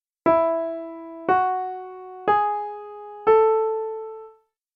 Treble E Maj tetrachord up

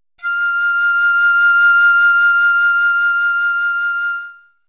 high F6 piccolo

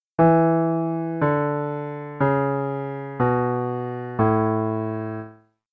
DOWN Bass A maj

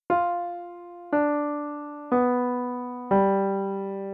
Bass G7 down

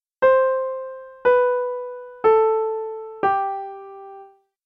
Treble G Maj tetrachord down

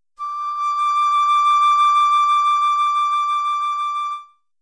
high G5 oboe

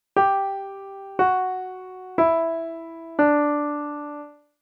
Treble D Maj tetrachord down

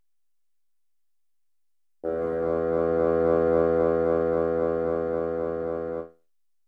E2 Basson

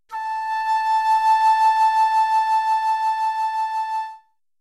high D6 oboe

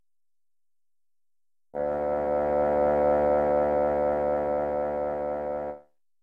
D2 Basson

